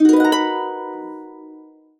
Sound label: harp chords, B maj7; USB Soundscan vol.62; Complete Harps; AKAI